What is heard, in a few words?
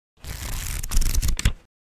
Book flicking through pages